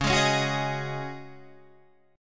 C sharp Suspended 2nd Suspended 4th, Guitar, Standard; strummed; 9,11,11,11,9,11